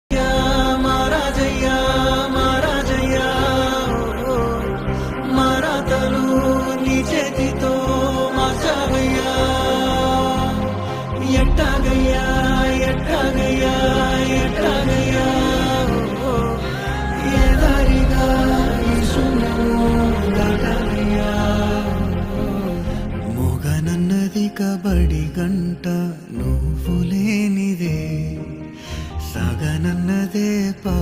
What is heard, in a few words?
telugu ringtone